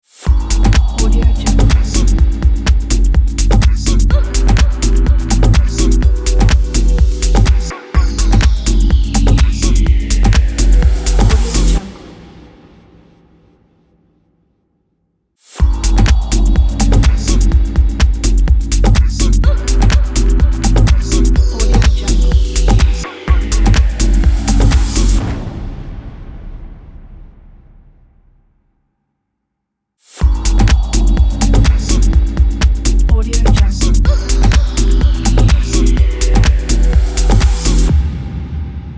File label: chill house logo